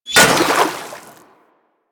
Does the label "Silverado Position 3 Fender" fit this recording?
No